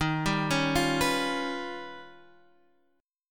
D sharp Augmented 9th D sharp Guitar Half Step Down